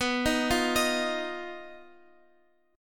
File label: B Major Flat 5th, Guitar, Open F; BMb5; arpeggiated; x,x,11,10,8,10